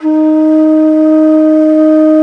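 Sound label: TENOR BANSURI; E-MU Producer Series Vol. 7 – Old World Instruments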